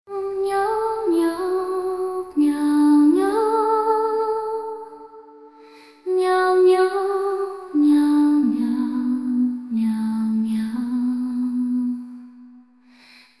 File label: Meow Ambient Newage; skladby v kočičí Mňaučině; MusicBoxAI